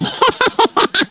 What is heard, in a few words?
G LAUGH; GUBBLE